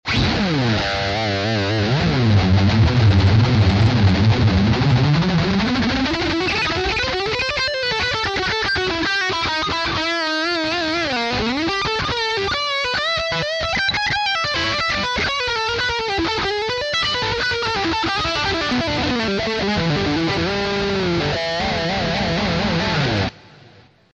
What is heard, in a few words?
shredding